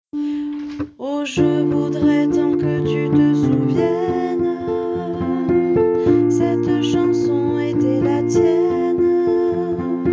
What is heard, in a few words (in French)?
basse